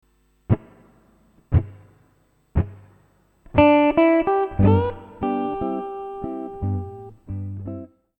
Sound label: Lick